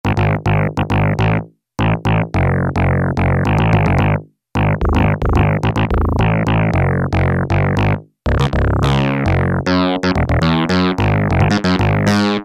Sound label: SYN-BASS, Yamaha DX7